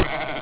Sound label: lamb